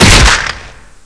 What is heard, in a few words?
boxing stab